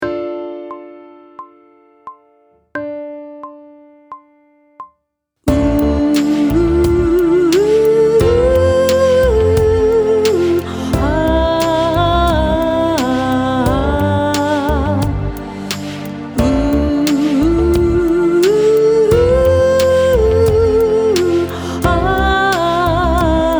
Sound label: Sight Singing with vocal, pop vocals; Grade 4